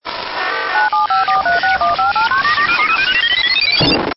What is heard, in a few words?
Stinger; STINGERS